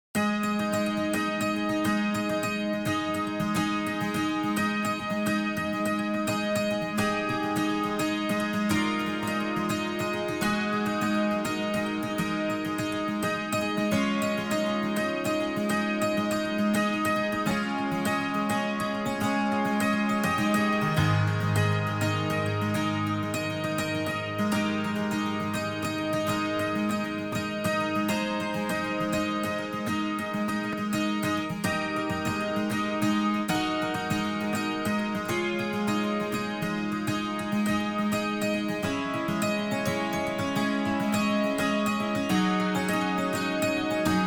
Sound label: Piano Dulcimer; MagicTouch